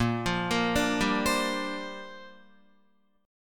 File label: A sharp 6th Add 9th, Guitar; A#6add9 arpeggiated